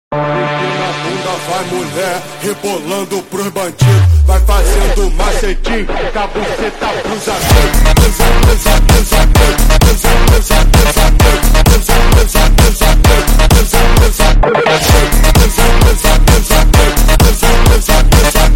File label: Phonk